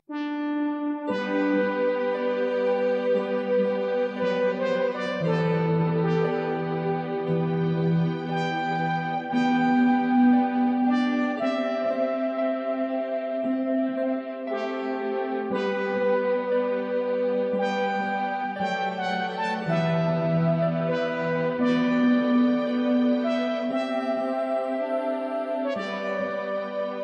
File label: Classical